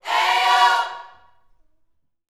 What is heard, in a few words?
YOUTH CH PHRASE, Vocal Planet Gospel